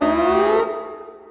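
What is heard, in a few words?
alarm loop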